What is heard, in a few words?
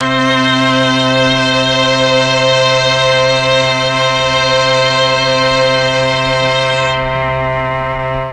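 C3 mixed strings, mellotron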